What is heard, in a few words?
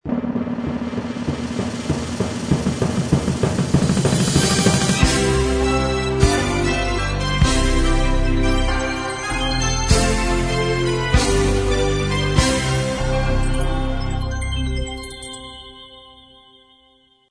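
New Year fanfares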